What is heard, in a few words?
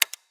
machine switch